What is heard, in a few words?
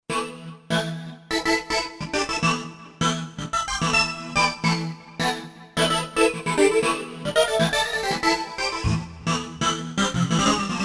Techno Saw; Roland SC-880; Factory Patches